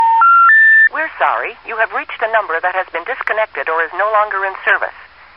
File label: disconnected phone